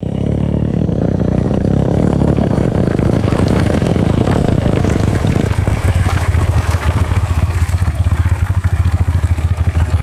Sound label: atv approaches stops and shuts off; vehicles